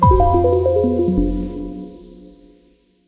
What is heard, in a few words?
level fail C